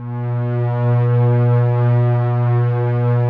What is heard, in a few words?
ATMO PADS; Best Service; XX Large